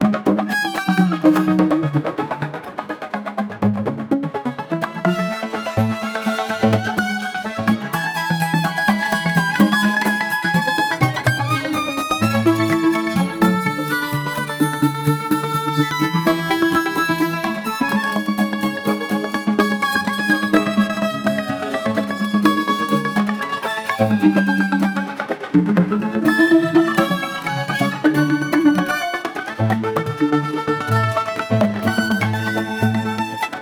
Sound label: rhythmic dynamic music